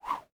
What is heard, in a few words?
Fight Move; mighty party 2017 gamerip pc ios android